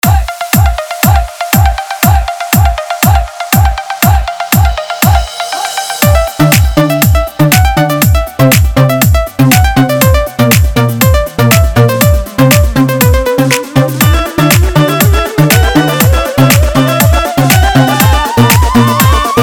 Remix

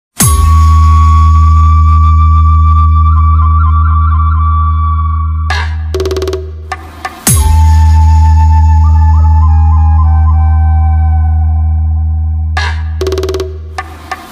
Sound Testing Beat Holi Mix

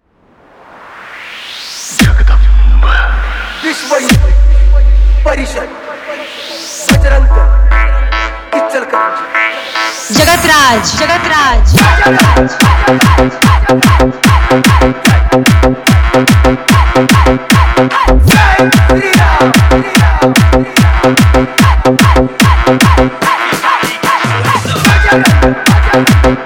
Hard Bass Beat Mix; BajrangDal Song 2018; Hi Fi; Compition Chailange; Kattar Hindu Mix

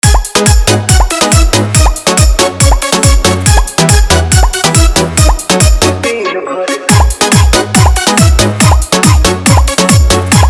Bass Band Mix

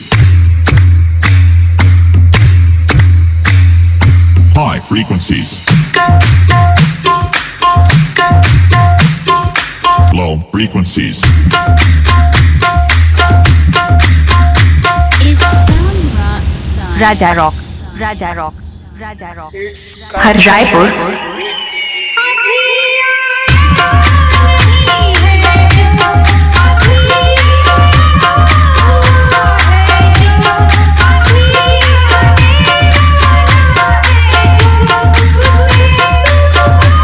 Filter Remix Song

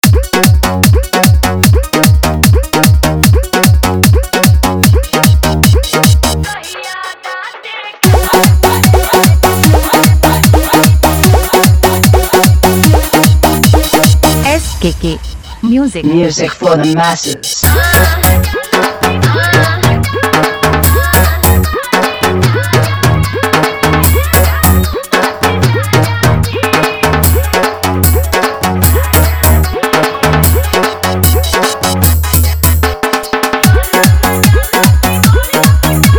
Bhojpuri Song Mix

